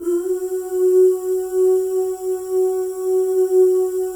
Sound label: FEM OOS, Symphony of Voices; Spectrasonics